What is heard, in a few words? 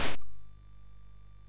MOS6581R2, sid; Defenders of the Earth